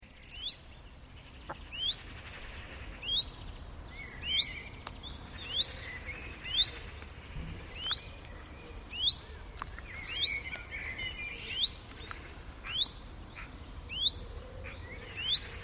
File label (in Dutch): Tjiftjaf Phylloscopus collybita Geluid; Annelies van Klinken